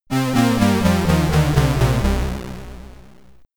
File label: Game Over (SID Stereo), Commodore 64; Metal Force